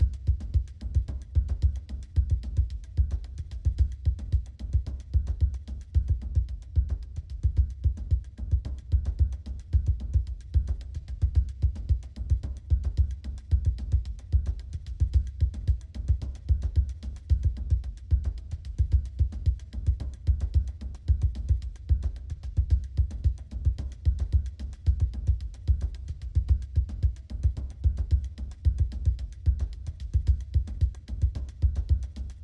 Music Terrain Flying; Google Earth VR

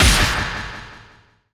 Enemy Rose Queen Active Fence Appear; gamerip

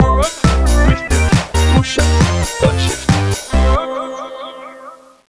Tektonik Fin; Imagine Modern Dancer; Nintendo DS

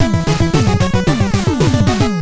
Victory Dance; Eradicator Genesis; Switch gamerip 2025

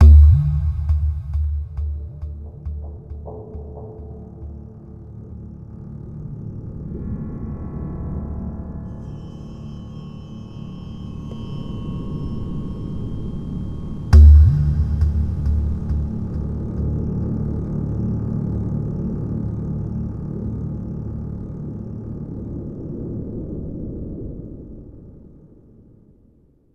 Amb Indoor

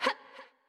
Fireball; Winkubus; Going Under

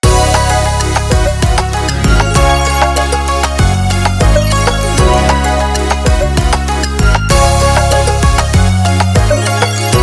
Bgm Menu

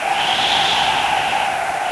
Wind Barrier Loop

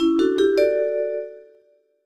Department Level up

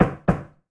Door Knock

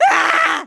Hurt; Neighbours From Hell Console Edition; GC, Xbox

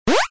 thumbsup; SFX Pickups

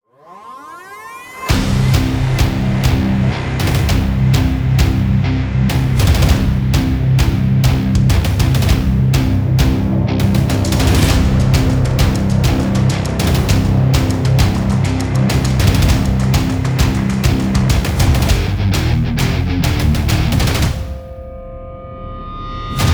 Turbulence Main Track; monster energy supercross the official videogame; ps4 steam switch windows xbox one; UPM

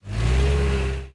Forklift Car Moving

